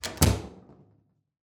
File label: Door Bathroom Close